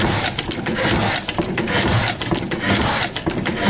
blackstone oil engine loop, 1909 10HP Blackstone Oil Engine; DRUM LOOPS and BREAKS